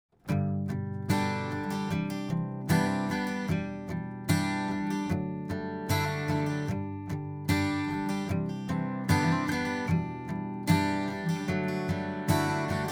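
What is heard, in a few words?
acoustic guitar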